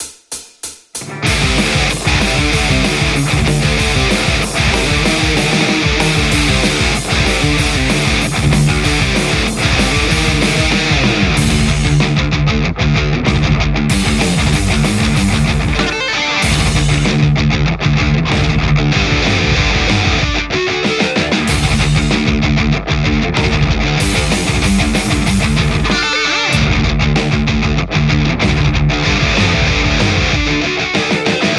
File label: metal tele; nickcrow wagnersharp